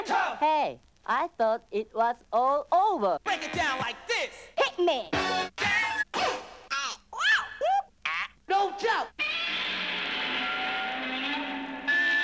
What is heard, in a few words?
pipe; skate